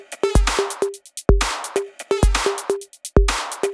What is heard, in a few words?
Electro Loop 128BPM; Vengeance Samples